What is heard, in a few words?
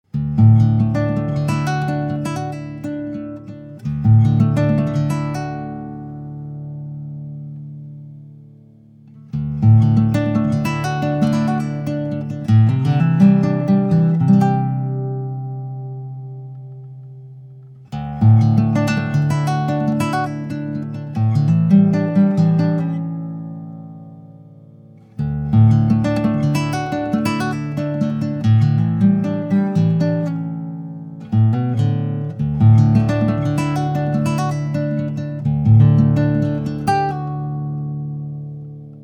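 Guitar Sample 23a, Vintage Prewar Guitars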